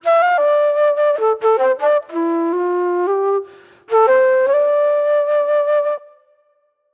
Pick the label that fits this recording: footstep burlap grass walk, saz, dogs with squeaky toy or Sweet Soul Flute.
Sweet Soul Flute